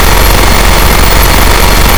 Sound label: minigun loop